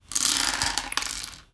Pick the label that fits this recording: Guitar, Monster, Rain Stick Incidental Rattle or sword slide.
Rain Stick Incidental Rattle